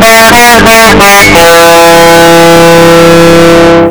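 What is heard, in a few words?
bjt opamp, guitar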